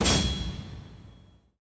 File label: Side quest completed